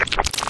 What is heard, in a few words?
Suck